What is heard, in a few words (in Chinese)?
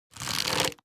弩快速装填一阶声